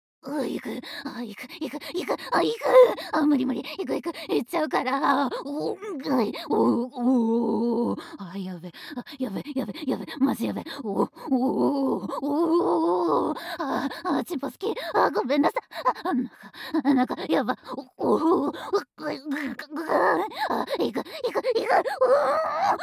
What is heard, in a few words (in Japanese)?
オホ声; ミオ